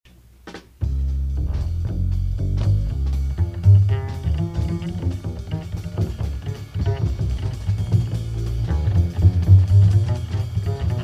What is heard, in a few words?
Blues; 1977